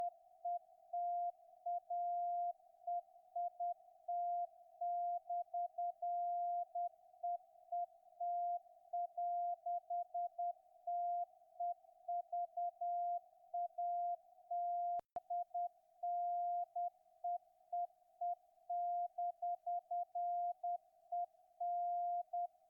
CW Bake; 28257khz; G33DDC; DK0TEN; Afedri

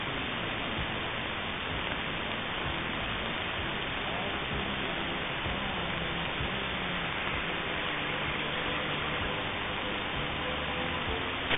G33DDC 6275khz; Free Radio Station

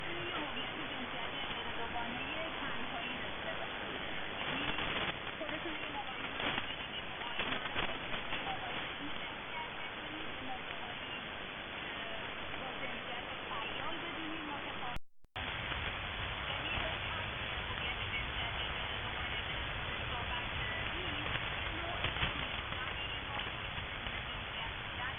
7580khz, AMS; Philippinnen; Voice of America

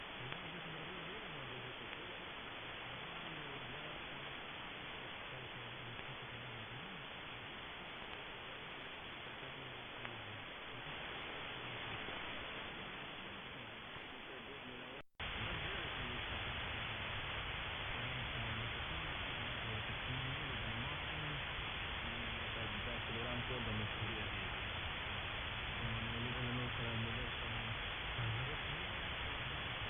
12130khz, AMS; Kuwait; Radio Mashaal

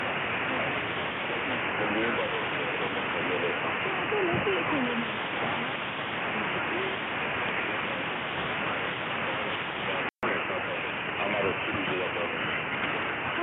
11795 kHz AM; Radio Free Asia

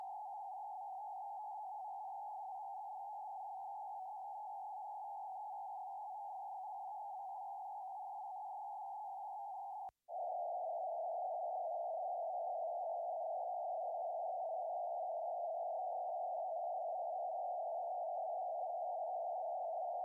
G33DDC 18.3khz; HWU; ColibriNANO

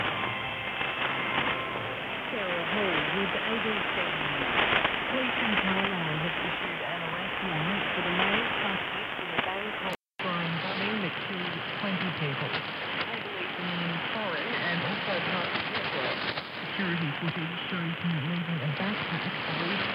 4835 kHz AM ABC HF1000 R9500

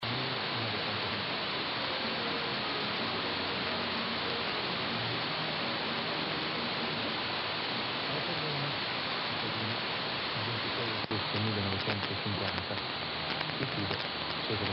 567khz; RAI

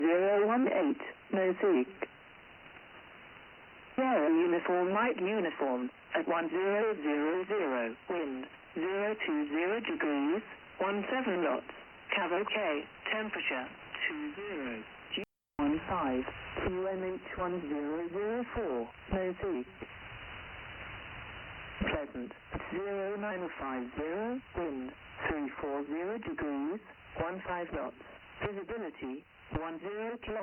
11253khz Volmet, USB; 11253khz-USB; England; RAF Volmet

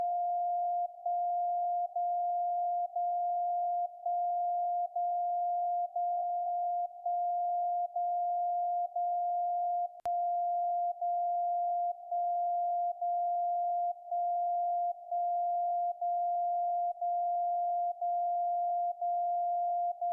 DCF77 77.5khz; SDRplay RSP1B

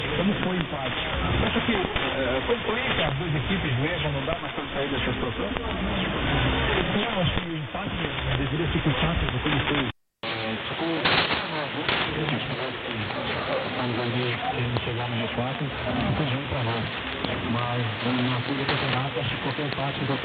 4885khz AM; Radio Clube do Para

